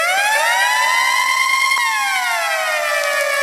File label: siren, Vehicles